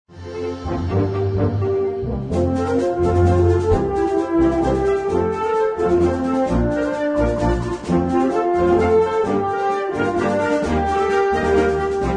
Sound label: drones